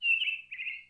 birds

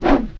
kick, weapons; SINDEMO

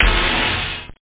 LO CHORD; Loop Scream Tracker Sample

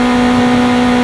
Suz Hi Lp, Engines; SuperBike2001

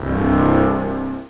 pipe organ brass; OctaMED SoundStudio V1; mirage

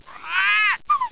Parrot

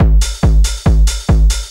Hard, Techno Drums; Techno Music Maker

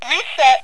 reset

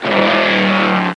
Zoom

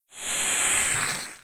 soul raven; kult

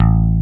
Picked Bass; cubic

